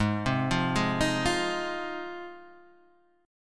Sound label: Ab 6th Ab Guitar Standard, Ab6 chord; arpeggiated; JGuitar